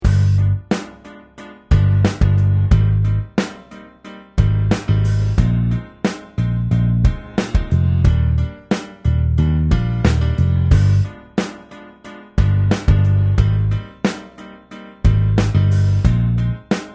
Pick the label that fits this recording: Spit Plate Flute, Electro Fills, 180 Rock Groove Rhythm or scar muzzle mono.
180 Rock Groove Rhythm